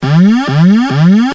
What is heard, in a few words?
warn alp discon